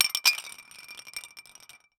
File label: objects